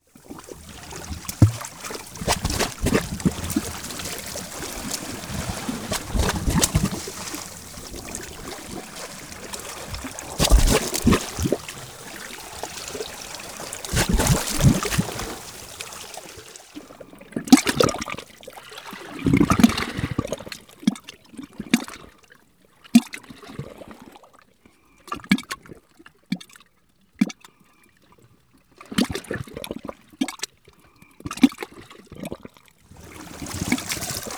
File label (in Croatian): MORE SKRAPE